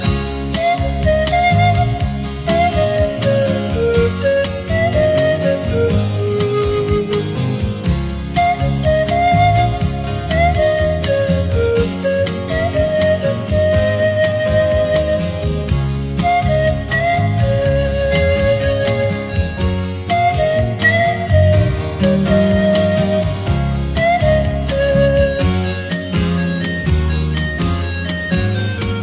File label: Flute Ringtone